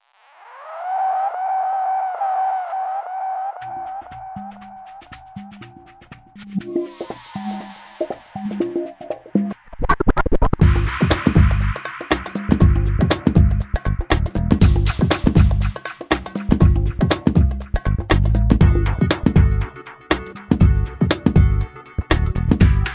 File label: Acid Jazz; Stylus RMX; Motor City